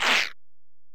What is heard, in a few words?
EPerc, Alesis DM-PRO